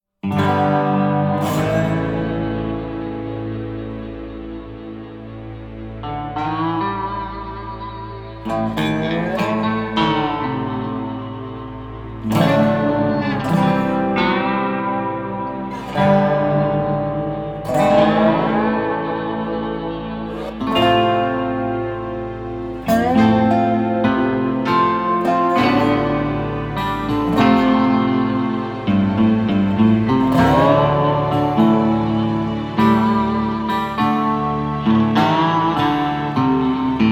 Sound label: Music Ambient Tension Full; starcraft 2 wings of liberty; MissionMusic